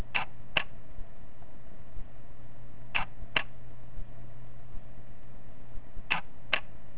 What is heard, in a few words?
quiet mode; Canon EOS-7D